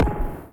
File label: perc spring verb; putney